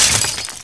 glass, debris; XDM

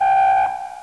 siren; XDM; ambience

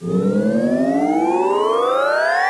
power up; buttons; XDM

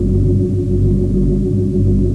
hum; XDM; ambience

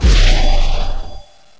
teleport local; XDM

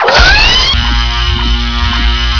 plasma start run; XDM

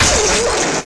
electro, weapons; XDM

